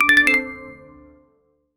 a short clear warning not, attention

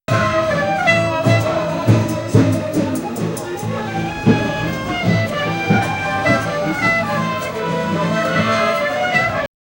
sound of a small busy, band

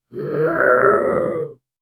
occasional anguished groa, humanoid

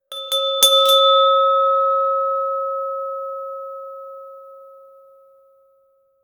boxing bell

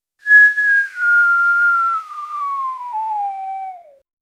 a long sliding whistle so

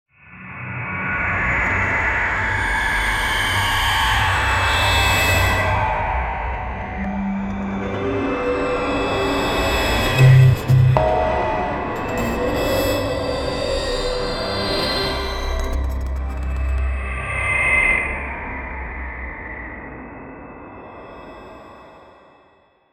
textures phoenix wing fla; cyberpunk

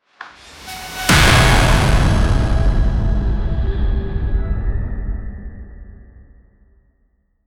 sound effect video game, death

